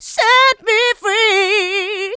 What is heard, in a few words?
Female Vocals; Houseworx